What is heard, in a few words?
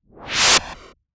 Swish